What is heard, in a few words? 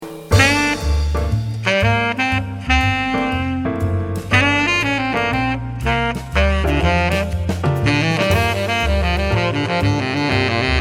Soloist Bari Marble